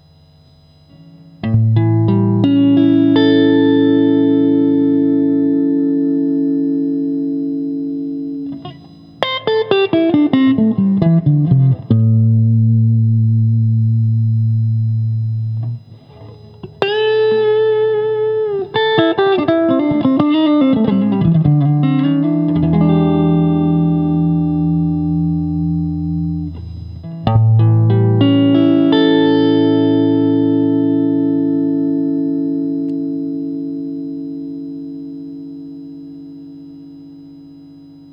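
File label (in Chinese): 60S OCC 单晶铜 清音 2档, acoustic guitar; kindman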